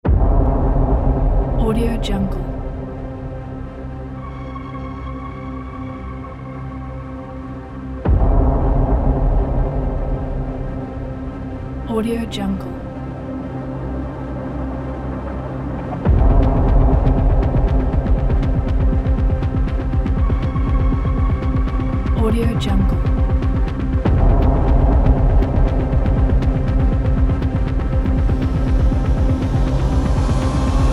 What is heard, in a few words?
sound design trailer; bhzaudio